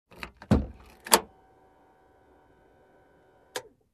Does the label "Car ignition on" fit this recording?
Yes